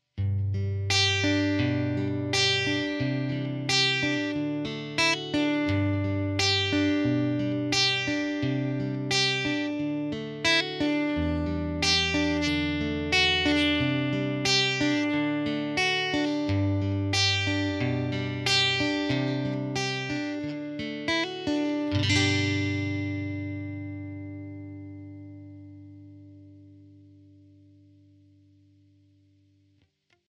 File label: guitar; guitarjack